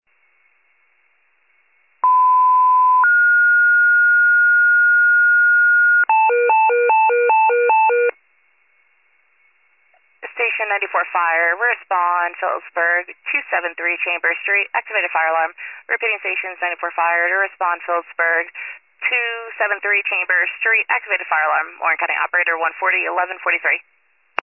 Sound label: FIRE pager alert